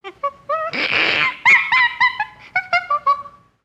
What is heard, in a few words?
Jungle Primates; gnarled forest